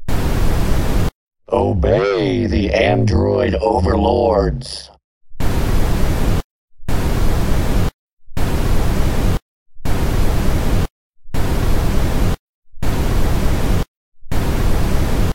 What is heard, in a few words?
Android White Noise